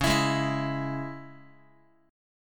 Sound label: Db Minor, Guitar; strummed; JGuitar